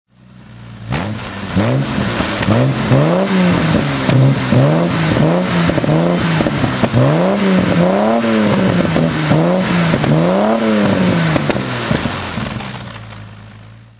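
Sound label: TR6 throttle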